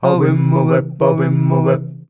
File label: "awimba" D#, MALES; Best Service; Hyper Dance